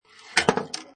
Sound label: Pinball Sound Ball Slides Of Rail And Falls To Floor; Video Games